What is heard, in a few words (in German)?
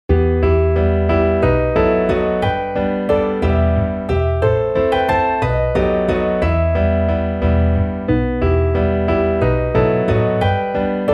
midi vaganten klavier